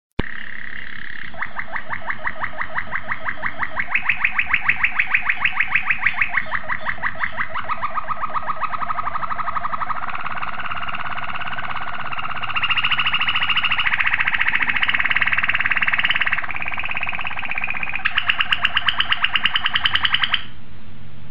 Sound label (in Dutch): Strofe